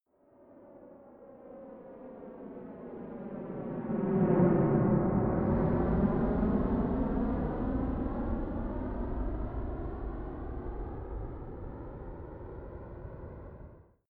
military jet fa-18 hornet turbine flyby fast distant